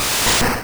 Acid Armor; sfx; attack moves; pokemon; gen 1; rby

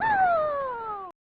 ''Hoooooo!''; super mario excitebike

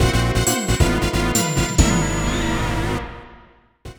Death; Groove Adventure Rave Plue no Daibouken; PS1

